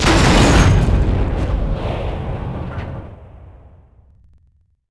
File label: distant tank destroyed; WAR